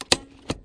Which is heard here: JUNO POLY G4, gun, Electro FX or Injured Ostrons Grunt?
Electro FX